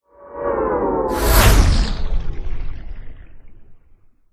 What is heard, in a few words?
Exit Warp; NanoFXv2; WarpFX; Dominion